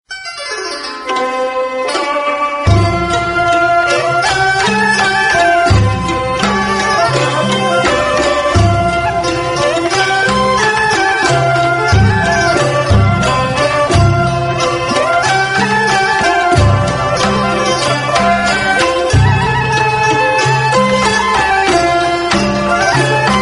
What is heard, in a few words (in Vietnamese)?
nhac le hoi